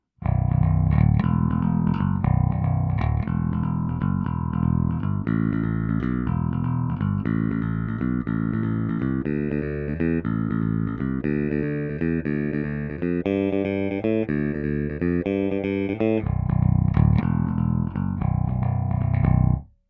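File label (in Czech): S-S trsátko, poniklovaná ocel, Roundwoundy, Schecter SLS Elite-5 baskytara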